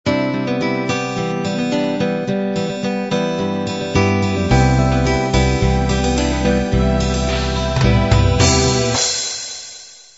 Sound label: love 8beat intro